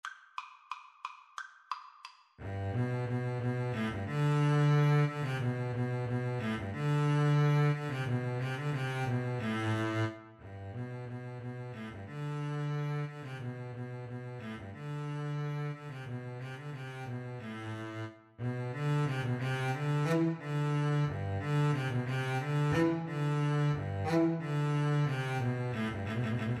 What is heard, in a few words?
Double Bass Duet